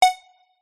multimedia game sound synth digital tone beep

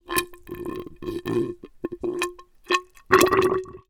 water gurgle dregs air pipe

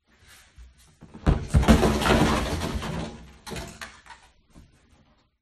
empty recycle bin; scott lawlor

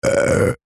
human male man burp vocalised descending

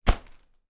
foley balloon foil inflated hit

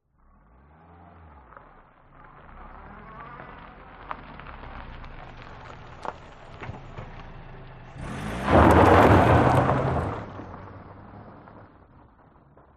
vehicles car reverse dirt track wheel spin away; zapsplat

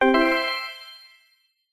game tone positive digital organ style

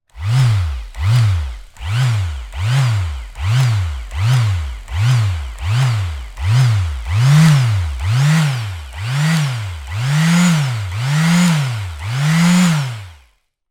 industrial orbital sander electric dry revs; zapsplat

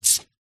household cd disc pick up from hard surface

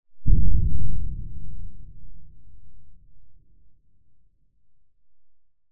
explosion distant large

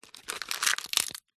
thick dry pal tree leaf base break crush twist; foley; zapsplat

